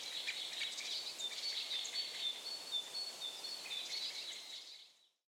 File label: BIRDS; dialogues au restaurant